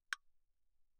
clave, hand percussion; claves

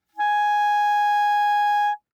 Bb Clarinet ff Ab5 stereo; Woodwinds

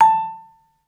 Vibraphone dampen ff A5 stereo; MIS Pitches - 2014; Percussion

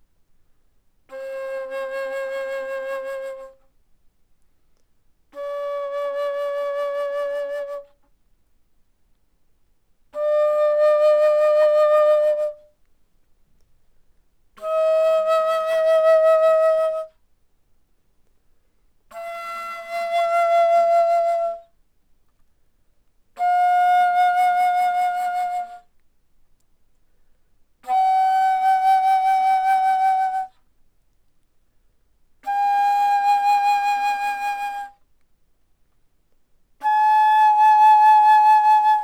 Bass Flute ff C5 B5, woodwinds

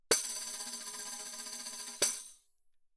tambourine shake roll ff; Percussion

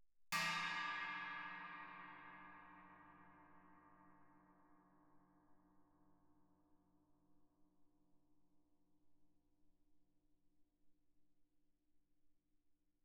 chinese stick shoulder mf, suspended cymbals; Percussion

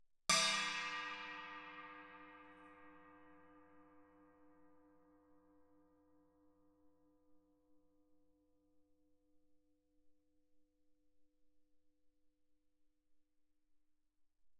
chinese stick normal ff, suspended cymbals; Percussion

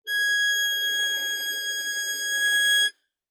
Violin arco ff sul E Ab6; MIS Pitches - 2014; Strings

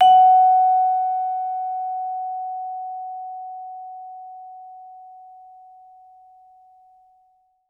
Vibraphone sustain ff Gb5 stereo; MIS Pitches - 2014; Percussion

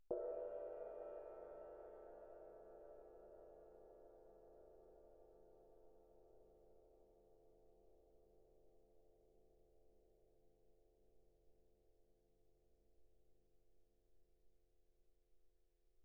ride mallet mf, suspended cymbals; Percussion

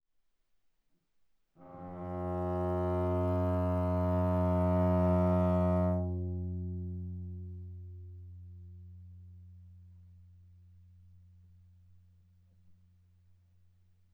Viola arco sul G ff G3 B3 stereo

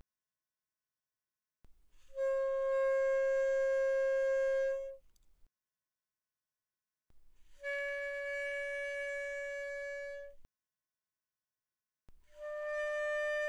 Alto Sax No Vib pp C5 Ab5, alto saxophone

